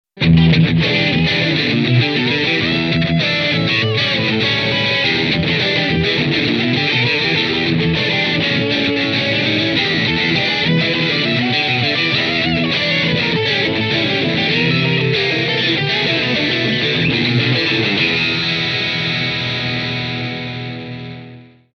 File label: Maxon ST9Pro+ effect